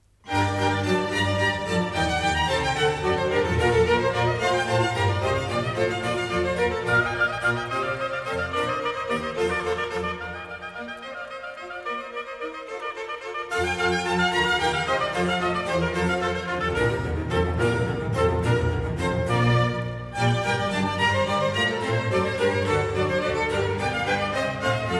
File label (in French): Concerto pour hautbois Bb Allegro; Vivaldi